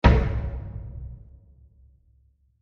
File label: Hammer Impact With Large Reverb; Miscellaneous Impact; Crafted Signals